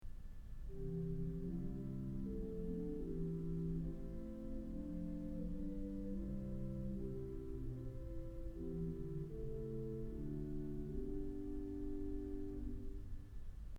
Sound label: Cor De Nuit; Kellogg Auditorium